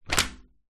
foley paper sheet a4 slam down by hand on glass table